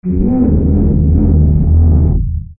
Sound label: moaning elements